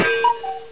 CUCKOO; 1999 - 2000 Dallas Stars Screen Saver

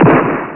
wipeout; greeblies

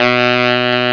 Tenor Lo; Instrmnt; Sound Library 2000; Wayzata Technology

